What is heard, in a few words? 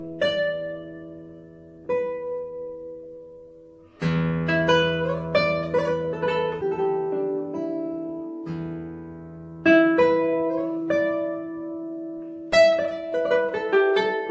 Bossa Nova Breeze